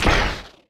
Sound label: Small Rocket